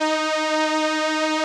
SOLINA, String Machines

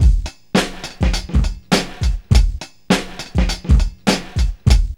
vibe beat 102; Zero-G; Funky Elements

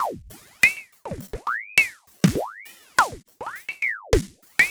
Ring Mod Beat; Electronic Point Blank

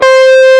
EG OD C4; AKAI S-Series CD-ROM Sound Library VOL-2

